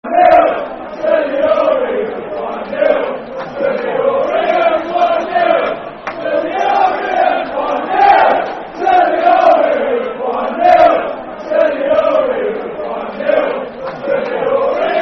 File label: Football Chant (Soccer Song); One Nil To The Orient; Leyton Orient Lofc